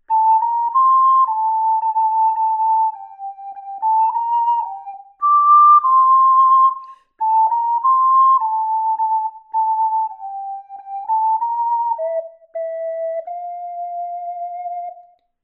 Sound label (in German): C 5 Loch Roti Roesli, ocarina